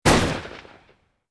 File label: Bullet Time; FXHome; Bullet and Gun